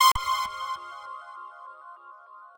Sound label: synth; osu